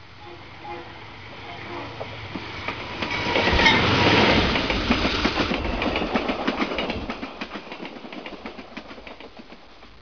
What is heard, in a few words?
Train commuter pass by